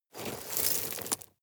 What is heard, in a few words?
sweep small rocks soft